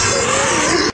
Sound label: pain; cstrike